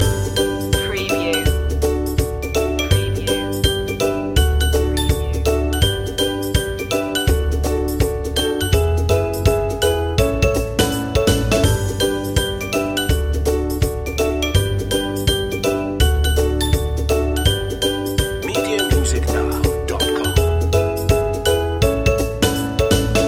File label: happy explainer loop